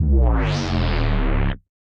Moon Drop Drone, bass; Ableton; Glitch and Wash

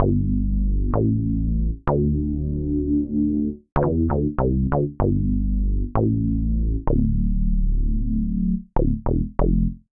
Hang Bass D Minor 96 bpm, Bassline; Tonal; MIDI Clips; Drive and Glow; Ableton; Factory Packs

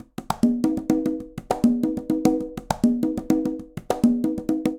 Charanga Conga-200bpm; Latin Percussion; Ableton; Factory Packs

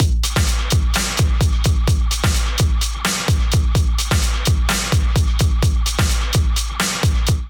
Kit 128 bpm, Drums; MIDI Clips; Matter Kit; Ableton; Factory Packs; Skitter and Step

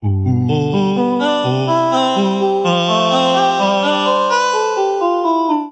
Chromatic Voice G Major 126 bpm; MIDI Clips; Ableton; Factory Packs; Voice Box

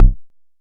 analog quick, Kickbank; Ableton Foundation FreePacks

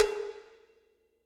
Cowbells Dark Plate Verb; Drum Hits; Ableton; Factory Packs; Session Drums Club; MPE Hits

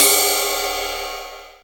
Ride, drum machines; Drum Hits

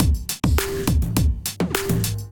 Animal Kit groove 103 bpm, Drum Essentials; MIDI Clips; Ableton

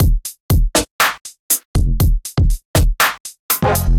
Kit, drum kits standard; ScatterLope Kit; Ableton; Factory Packs; Drum Essentials

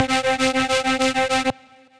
Legacy Roar, Synth Rhythmic; Ableton; Factory Packs; Build and Drop

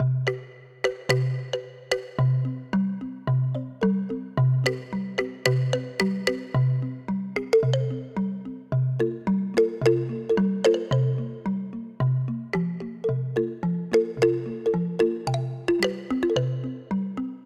Bambo Wall C Minor 110 bpm; MIDI Clips; Tonal; Ableton; Factory Packs; Lost and Found

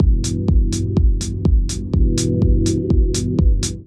Genome Kit 124bpm; Ableton; Punch and Tilt

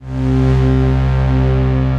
Wavetable Pad Blender, Ethereal Pads; Ableton; Mood Reel

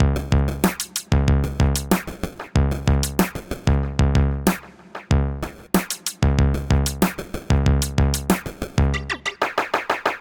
80 Beat, Drum Machines; Trax Trouserpants; Ableton

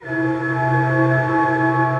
Gloaming Atmosphere, Dark Textures

